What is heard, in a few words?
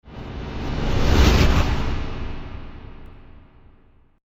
Ghost Reverb Horror; horror sound effect; Ghost Sound Effects; Andrew Fleming